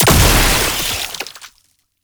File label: Entangle Projectile Explosion; Warframe; Oraxia